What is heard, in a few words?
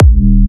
Kick E; MONTAGE